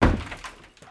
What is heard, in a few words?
shutter